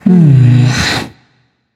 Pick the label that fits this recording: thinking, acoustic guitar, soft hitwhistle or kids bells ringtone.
thinking